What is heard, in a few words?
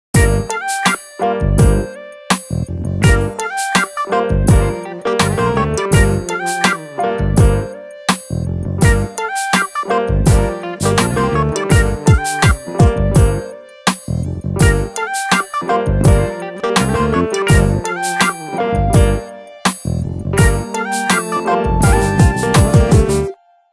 Coast Drive, Nokia Tones